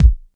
classic rap kick drum sample B key, Royality free kick drum single shot tuned to the B